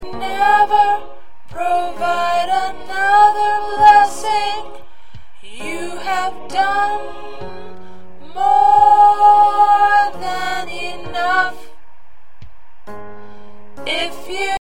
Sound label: Soprano, choir parts